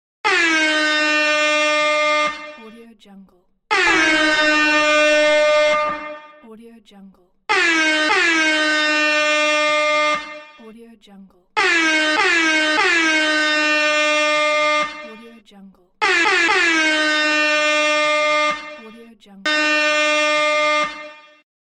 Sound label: air horn